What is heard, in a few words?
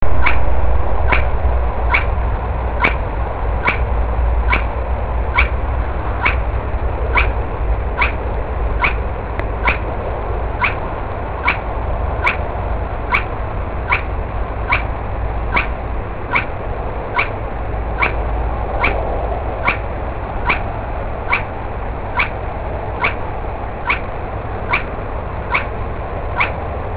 spotted crake